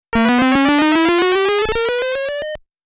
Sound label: Miss; Taito